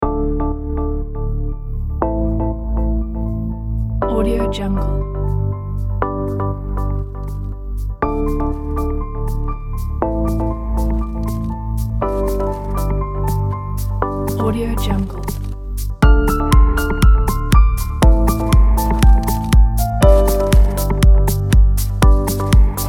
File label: abstract winter piano